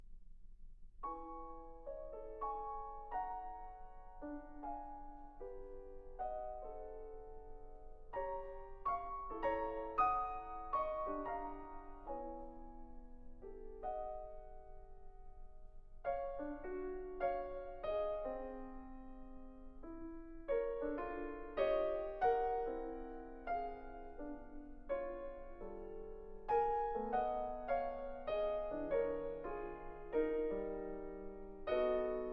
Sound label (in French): etudes pour piano; en suspens